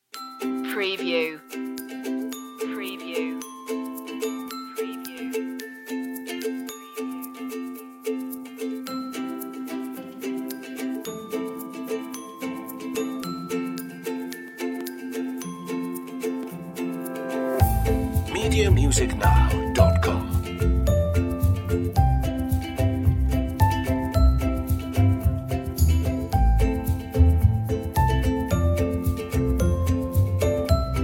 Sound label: take my hand no whistle